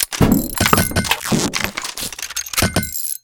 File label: turret repair